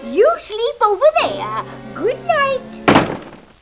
sleep

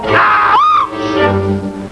ahooch

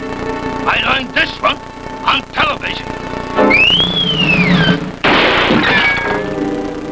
television; Tom en Jerry

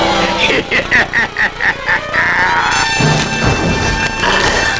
laugh; Tom en Jerry

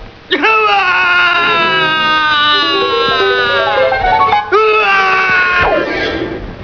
cry; Tom en Jerry

